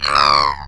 SCREAM; Shadow Master

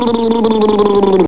BUB BUB; Gold Medal Software Volume 1; music

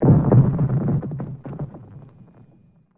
Cracking; ImpGal2; colony

